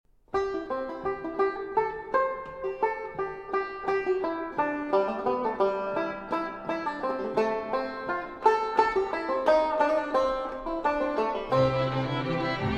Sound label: concerto banjo